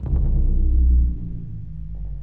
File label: d bass chorus